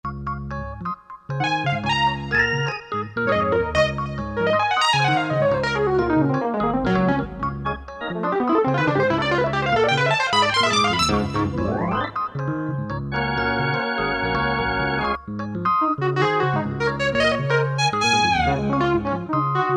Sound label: rhodes moog b3 test